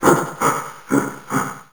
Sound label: pig roam; duke